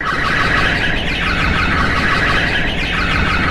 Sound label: European Bee Eater